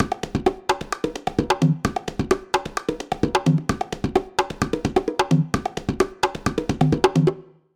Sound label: 130 Percloop